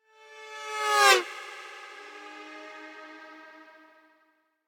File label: Horn Trumpet Doppler By - Ominous Passing; Hollywood Edge; sound designer's toolkit v2